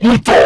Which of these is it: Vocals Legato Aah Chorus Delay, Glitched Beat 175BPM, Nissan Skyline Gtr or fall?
fall